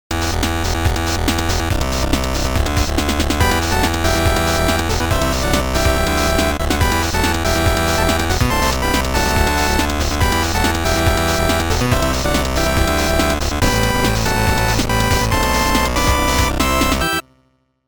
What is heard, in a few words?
8 Bit Ditty